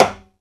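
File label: closed rims, timbales; E-MU Producer Series Vol. 2 – More Studio Essentials; Composer; Timbales Xswitch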